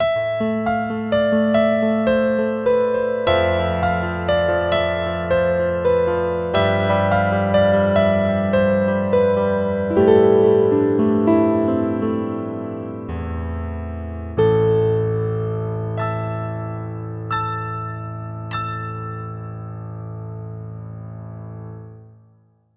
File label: Piano; KORG OASYS; Piano Evolution 2; oasys assault